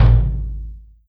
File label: TOM; osu